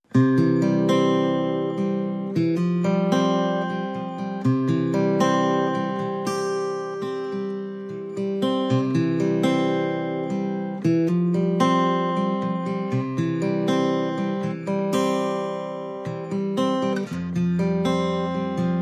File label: Guitar Only